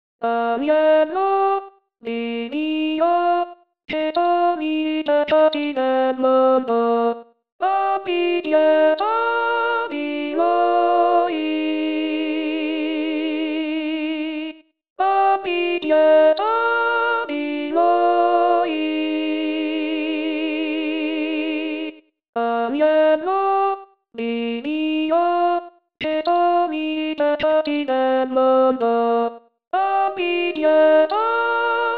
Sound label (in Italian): Agnello di Dio Contralti; Auricchio; anno liturgico